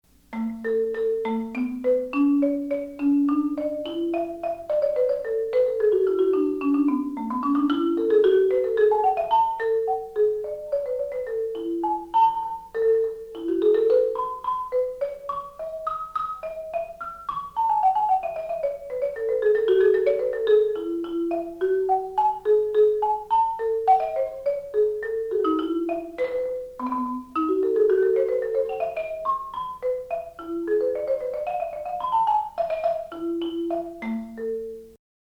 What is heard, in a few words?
mallets